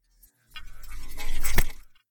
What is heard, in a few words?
spawn, monsters blub